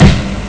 bass drum; Amiga Soundtracker Sample Packs

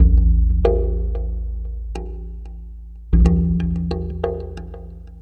Tribal Wave Drum

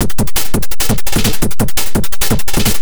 Beat, Industrial

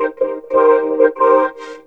Korg, FX

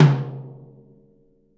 Living Drums; Sampleheads; Peter Erskine

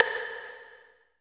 V. STICK; East West; Phat & Phunky

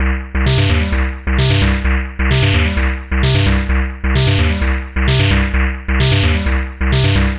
130 Cm bass, bass loops, trance; Trance Explosion Vol1

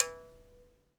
mouth bow, Africa; Roland LCDP11 Africa VOL-1; Sm Mouth Bow

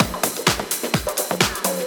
Latin Loop 128 BPM

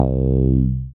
dance bass; Sound & Vision - Gigapack II CD 1

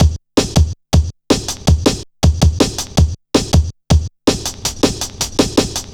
Boy, Drum Loops, Jungle, 161.5

